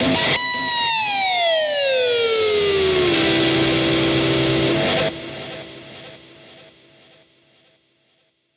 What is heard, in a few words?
Electric Guitar Scream